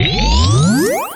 RETRO Jump Up Bounce Long; GameBurp; 2000 Game SFX

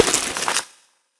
hit, Processed Foley; Sound Design Tools

